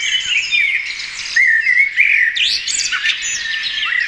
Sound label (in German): Voegel; Break; Austral